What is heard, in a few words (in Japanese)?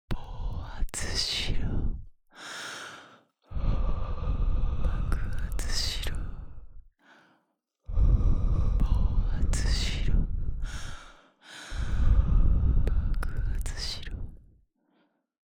囁き 吐息; 暴発しろ×爆発しろ